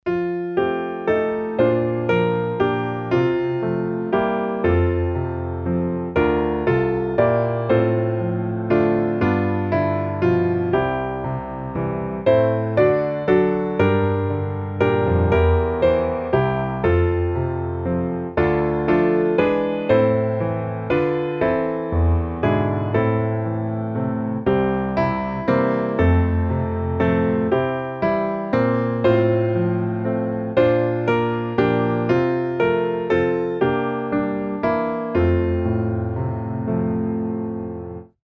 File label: piano